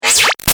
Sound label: Laser Dirty Beep; Sound Design; Lasers; Big Room Sound